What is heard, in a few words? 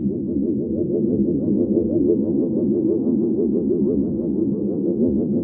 whup whup warble ambience; LOOPING AMBIENCE